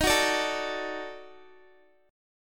Eb Minor Major 7th Flat 5th, down, Guitar; strummed; 4F,4A,5C,5G,5C,6E; chord; JGuitar

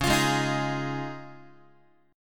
Db Major Add 9th, Guitar; strummed; JGuitar

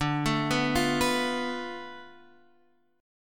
D-Minor 6th, D, Guitar, Standard; 10,12,9,10,12,x; Dm6 arpeggiated

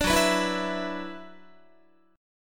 F sharp 7th Suspended 4th Sharp 5th, Guitar; F#7sus4#5 strummed

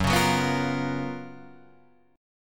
F sharp Major 11th

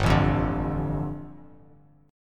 G 7th Suspended 2nd, Bass Standard; strummed; JGuitar

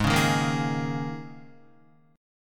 Ab Suspended 2nd Suspended 4th Ab down Guitar

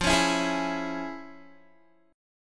F Minor Major 7th Sharp 5th Guitar; FmM7#5; strummed

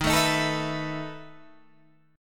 E Major 7th Suspended 4th Eb, chord

